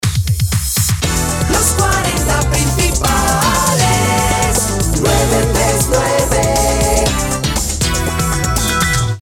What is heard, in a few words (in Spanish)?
jingle; 40 principales